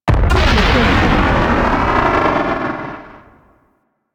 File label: Explosion; DE; Warframe; Weapons; Archwing; Powers; Archwing Big Boy